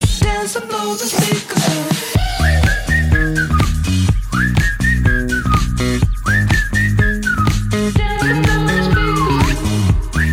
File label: samsung galaxy note; Note 20; dzwonek na telefon